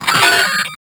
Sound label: Hack Minigame Symbol Corruption; Entrati